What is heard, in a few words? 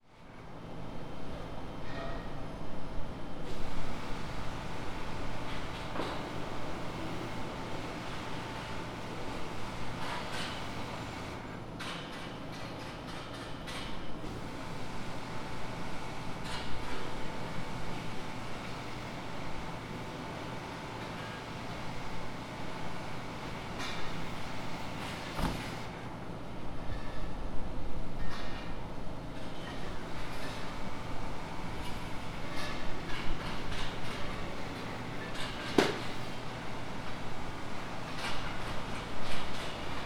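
paseo, Fukushima Soundscape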